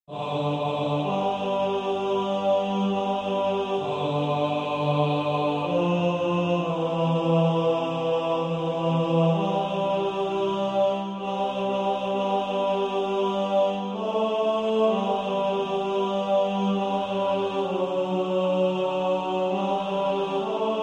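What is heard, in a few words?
tenor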